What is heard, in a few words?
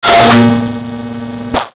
Electrical Whoosh Abrupt